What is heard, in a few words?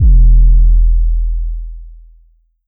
808, E